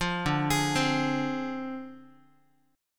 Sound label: D Minor 7th Flat 5th Guitar; Dm7b5; arpeggiated